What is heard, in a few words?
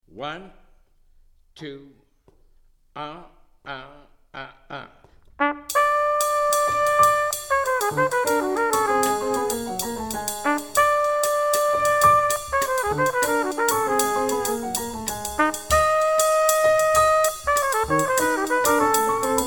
minus bass; count off sets up the intro; play figures for the intro